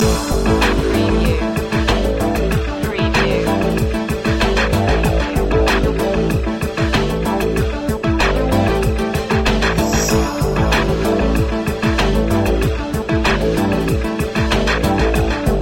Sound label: menhir loop